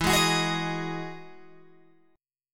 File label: E Major 9th E down Guitar Open G